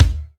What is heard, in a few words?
soft hitwhistle